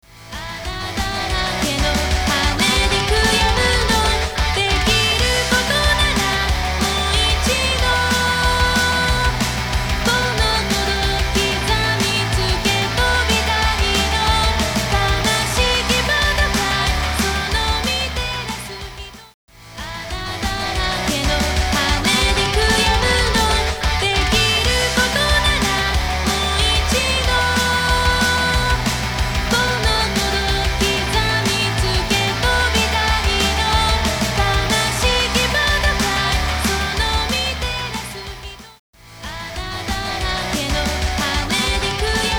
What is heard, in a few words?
karaoke bgm; yakuza ryu ga gotoku kiwami 2 pc gamerip